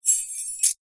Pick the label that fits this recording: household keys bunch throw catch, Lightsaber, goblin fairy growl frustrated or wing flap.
household keys bunch throw catch